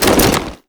foley step, soldier; combine; soldier charger

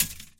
chainlink tap; scripted sequences, a2

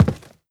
step, footsteps cardboard

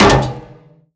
vent step, headcrab reviver, creatures

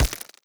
step layer, headcrab reviver, steps, creatures

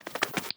hop, crow; Half Life Model Pack; Half Life 2

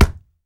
body impact fists; physics

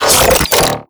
manhack charge end; npc; Half Life Alyx

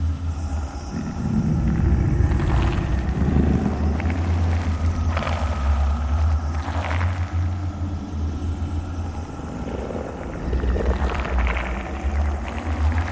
membrane loop; infestation; Half Life Alyx; xen

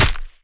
flesh, bullet impacts

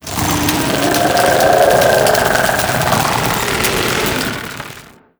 getup long, zombie revived

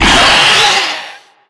death, antlion; creatures; vox

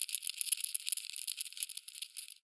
rodent scratch

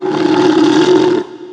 frustrated growl, antlion guard; npc; Half Life 2; Episode 1

